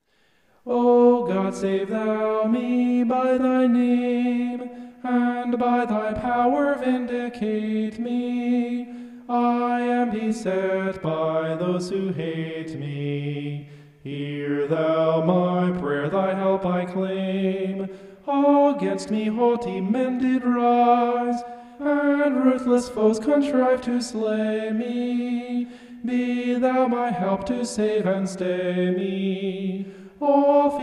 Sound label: vocal homoph; psalm 54